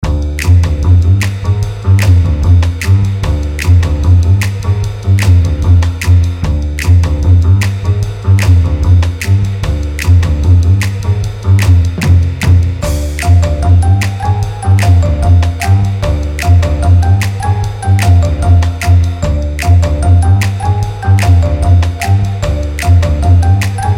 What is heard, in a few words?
game music